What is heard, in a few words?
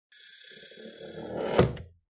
Wooden Door Squeak And Close; Creaky Doors; Sound Effects:Doors; Lynne Music